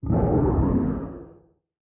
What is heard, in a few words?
ambient fog; STALKER